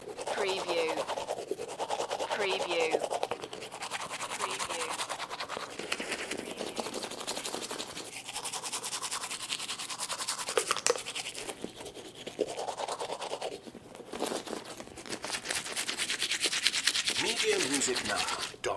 Brushing Teeth, Toothbrush Cleaning With Lots Of Foam